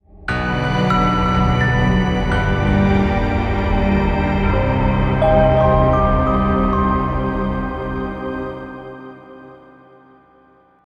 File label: Game Over